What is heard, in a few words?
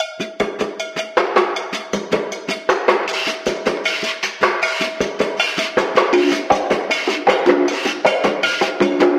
slow building congos; Drum loops